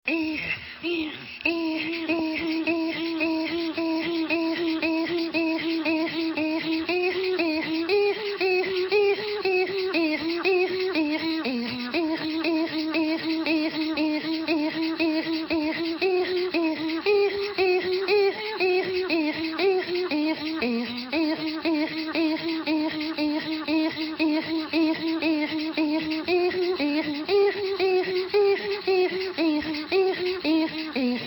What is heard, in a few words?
Inuit Sample